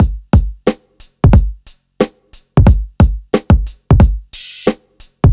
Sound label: Metro (90BPM)